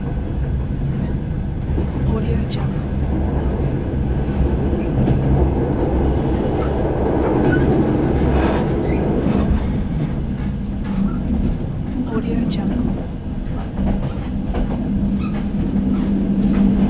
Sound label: train interior; urban sounds